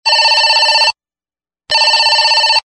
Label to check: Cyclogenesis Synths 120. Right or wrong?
Wrong